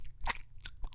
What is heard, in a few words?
Slimed